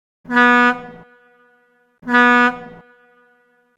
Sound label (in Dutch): twee keer kort